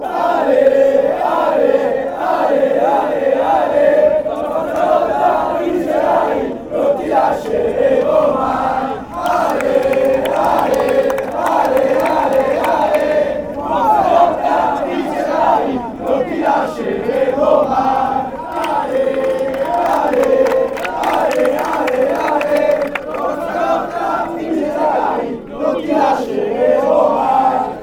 come on come on, chants

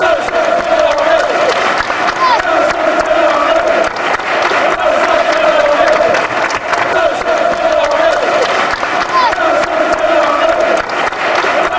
fan chants; gareth southgates army

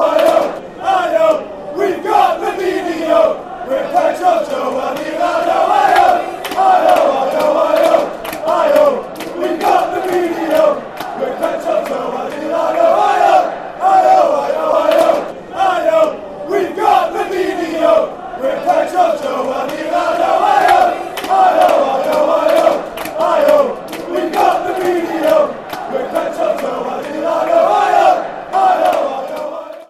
hi ho fan chants; robinho

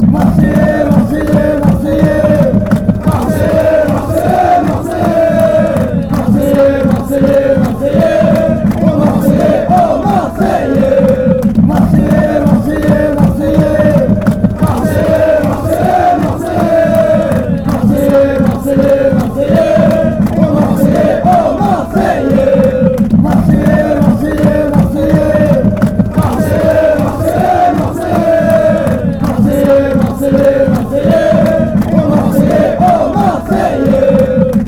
fan chants; marseillais

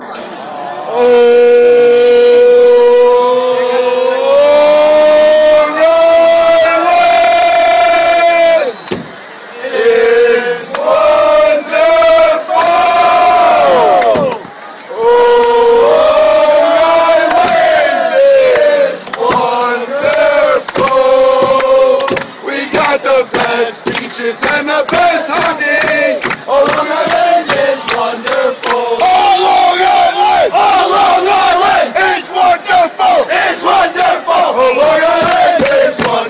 oh long island, chants

